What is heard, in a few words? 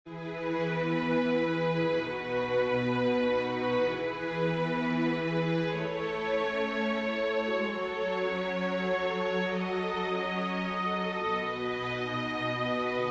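prelude major for string quartet; chopin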